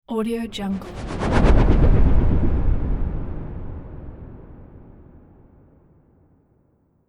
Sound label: slow fluttering whoosh; sound ideas